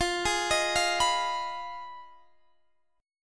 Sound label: F Minor 7th Flat 5th, Guitar, Drop D; x,20,18,20,18,19; down; chord shape